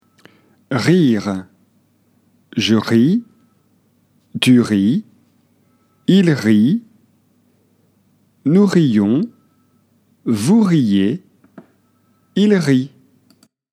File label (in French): rire